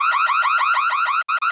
Car Alarm

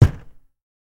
wood door bang

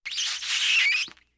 plastic scratch

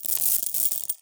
peas glass jar

